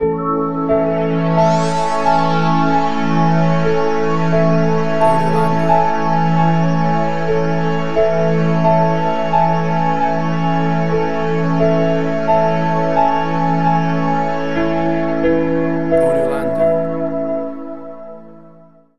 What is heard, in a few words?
Ambient Dream